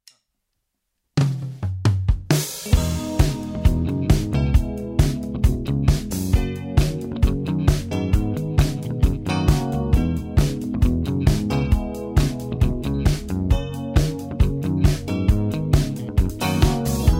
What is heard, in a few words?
134BPM Gm